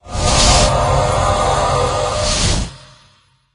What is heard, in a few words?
Cast Shield